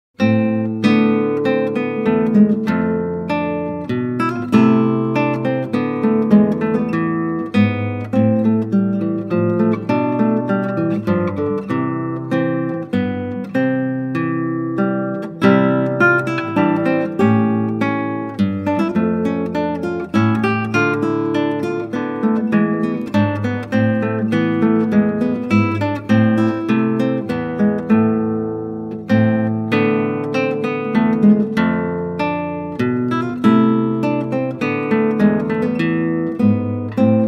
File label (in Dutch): Klassieke Gitaar; Bach 50 Solos